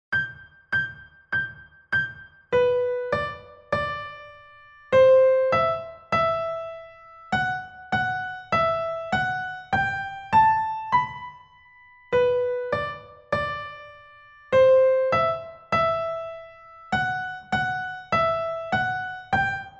Zauberklavier 100 Primo; Uebungs-CDs; Playbacks; Little Brown Jug

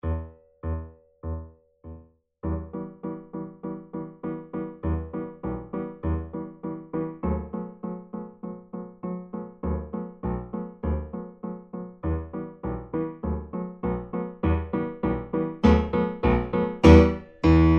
Secondo, Vierhaendige Uebungsstuecke; Playbacks; Diabelli

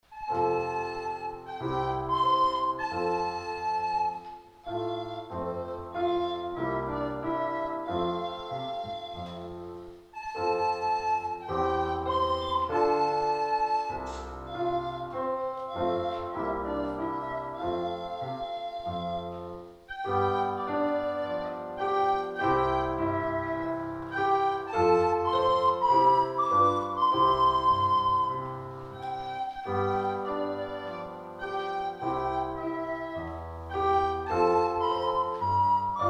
Blockfloete; Konzert

